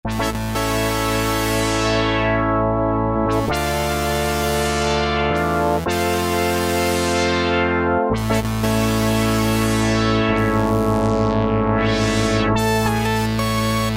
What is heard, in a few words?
Brass; Cheetah MS6